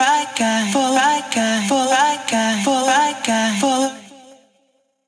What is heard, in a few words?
vocal loop a wet 125bpm